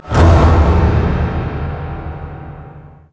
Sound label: String Medium; Dead Space; PS3, Windows, Xbox 360